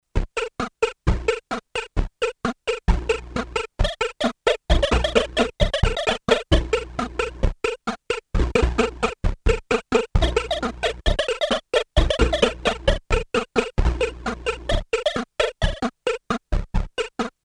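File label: fx drum, analog polysynth; FX: DRUMS; Solton Project 100; Solton SM100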